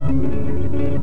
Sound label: atmosphere hover